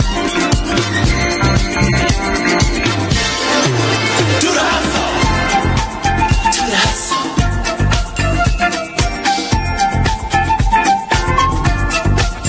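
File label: sounds and music; iz tik toka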